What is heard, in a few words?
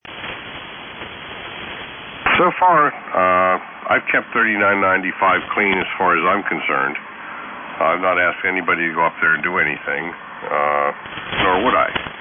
jamming 3860 kHz